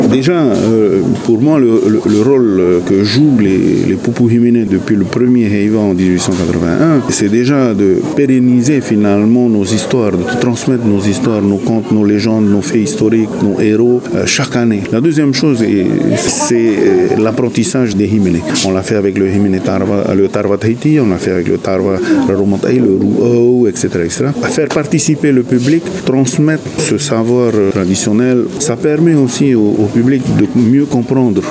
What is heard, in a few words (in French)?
Chant